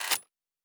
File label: Weapon Foley; Sci-Fi Sounds